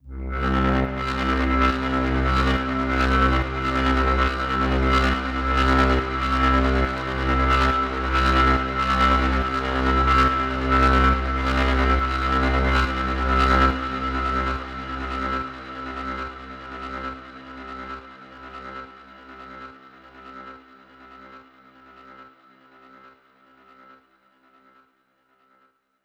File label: Overlay Drone; Act3 Rezoning